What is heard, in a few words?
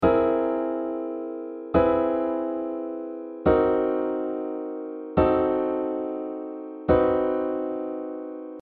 seventh chords